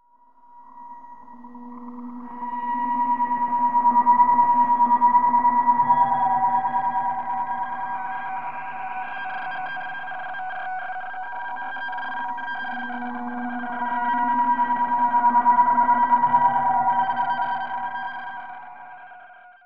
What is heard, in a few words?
spacey; Rumbles